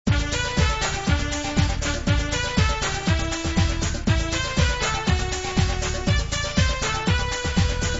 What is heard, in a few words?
Title And Bonus Music; Adventure Elf Online